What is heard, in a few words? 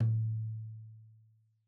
Tom2 Stereo, Deeply Sampled Drums; SMDrum